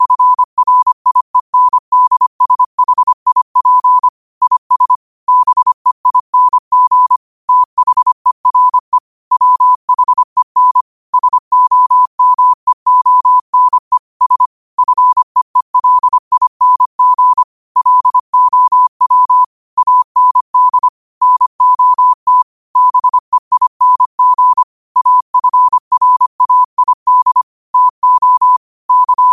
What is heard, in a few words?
5 wpm, morse